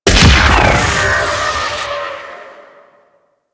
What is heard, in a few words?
Sabotage Cell Destroy; Warframe; Missions